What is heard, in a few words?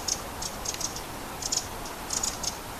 Winter Wren